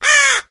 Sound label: idle, crow